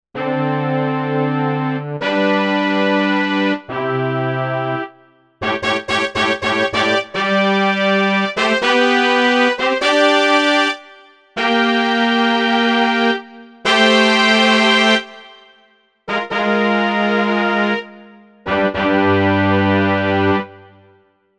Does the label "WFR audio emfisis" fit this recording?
No